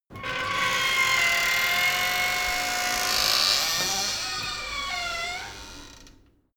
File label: metal door screak thriller close slow